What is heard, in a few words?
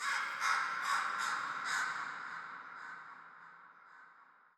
crow, ambient, nature, birds; Portal 2